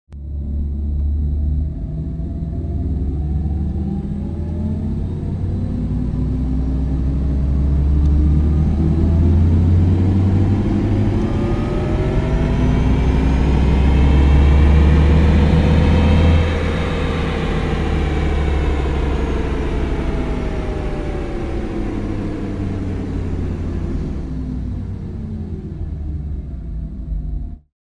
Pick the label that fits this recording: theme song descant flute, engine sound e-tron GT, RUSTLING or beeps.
engine sound e-tron GT